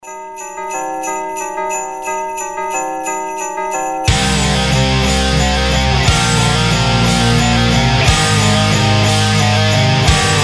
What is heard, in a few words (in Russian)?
rington rojdestvenskiy metalkor